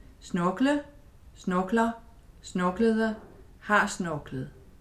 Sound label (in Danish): snorkle